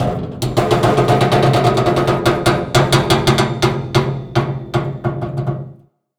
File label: metal creak